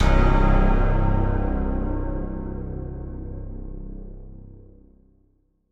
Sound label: Horror Sting; Apollyon